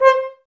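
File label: Brass French Horns C; Sample CDs; Miroslav Vitous; Woodwind Brass Ensemble; F.Horns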